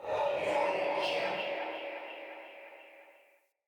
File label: Transmission In; Fenn Jotar; Transmission In Outs